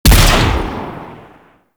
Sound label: Blastor Pistol Launch